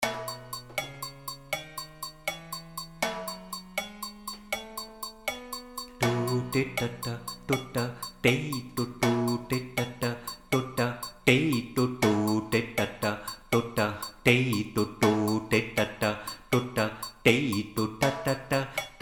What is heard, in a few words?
yoruba loop